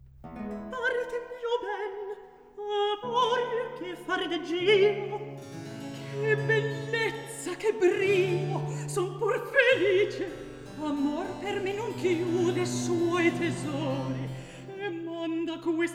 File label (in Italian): Recitativo; Atto III; Scena 6; (Orlando, Alcina, Bradamante, Ruggiero)